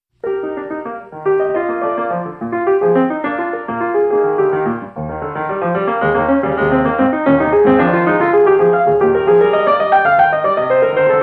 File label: Prelude in E flat major; J S Bach